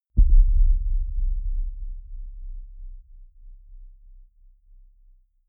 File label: deep sub hit heavy